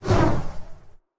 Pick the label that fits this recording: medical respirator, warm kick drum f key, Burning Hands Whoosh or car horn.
Burning Hands Whoosh